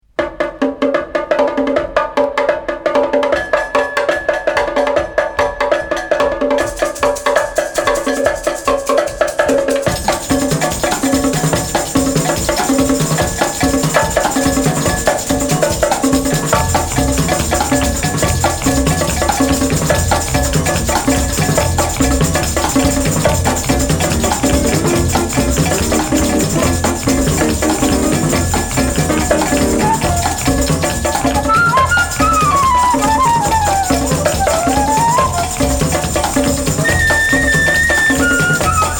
hymns a swinging

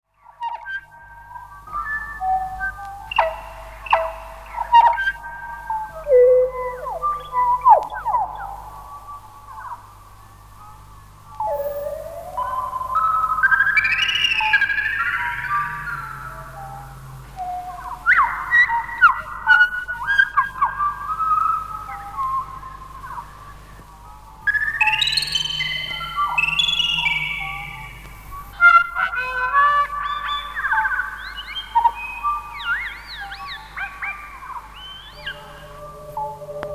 new zealand electroacoustic

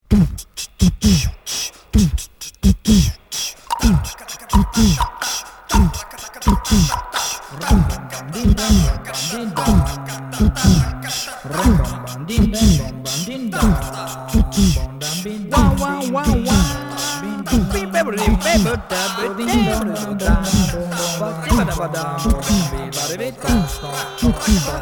monofonic orchestra

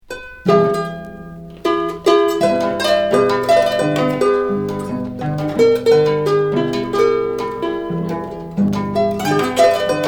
inca harp

